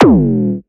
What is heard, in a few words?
Classic Synth Tom, One Shot Drums; Sonic Foundry (Sony Creative Software); Knutrix Drum & Bass